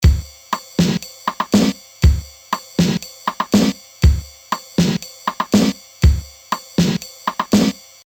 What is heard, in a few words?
Casio RZ1 Loop